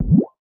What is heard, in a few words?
bounce; codeskulptor